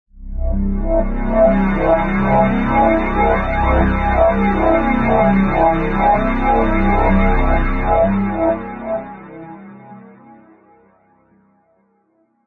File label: Atmos; Zenhiser